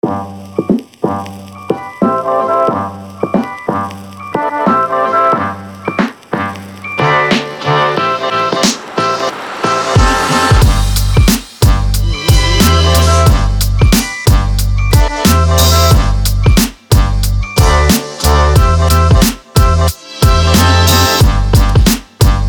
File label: Hip-Hop